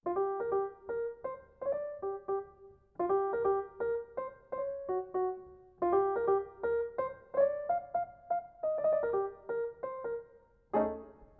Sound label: jazz LPF; low pass filter